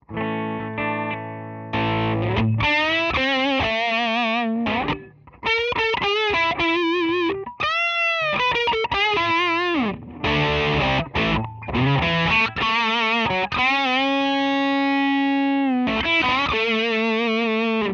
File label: Bluesdrive Rotund; GFS Effects